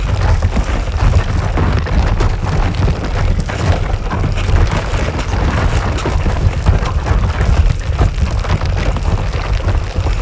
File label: Roll Loop; Evolve; Behemoth